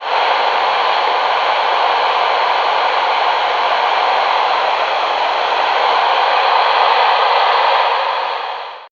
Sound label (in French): Signal de diffusion de television numerique DVB T 54 MHz 890 MHz; Ondes électromagnétiques et électromagnétisme